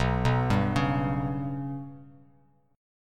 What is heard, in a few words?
B Suspended 2nd Sharp 5th B down, Bass Standard; Bsus2#5 arpeggiated; JGuitar